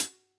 close Cl Hat, drum

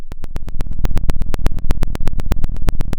Sound of a fire crackling

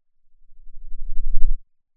pop

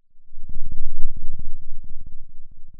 pop, Sound of a thunderstorm

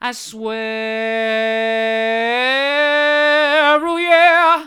i swear hard, vocal

